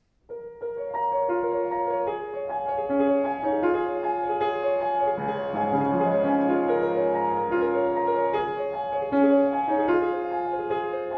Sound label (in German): Grotrian, klavier; 124 grotrian steinweg classic 1997 schwarz